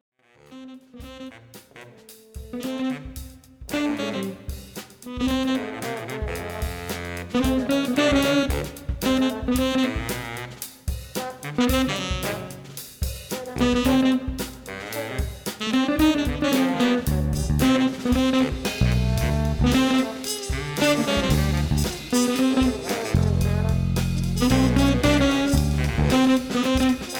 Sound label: jazz